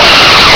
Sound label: BLAST